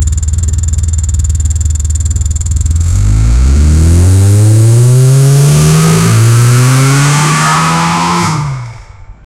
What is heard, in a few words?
scooter powering up vrrrr